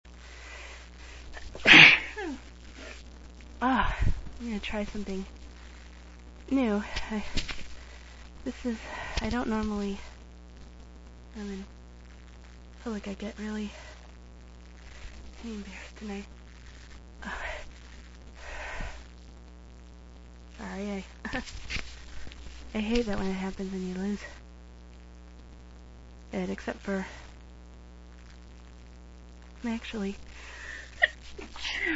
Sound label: stifles, female